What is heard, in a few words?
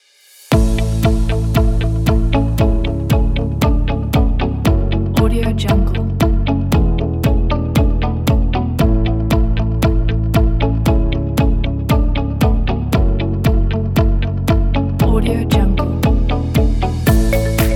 mellow corporate